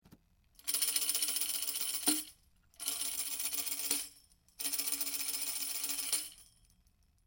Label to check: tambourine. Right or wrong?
Right